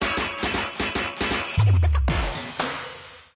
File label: Victory Sting; Descendants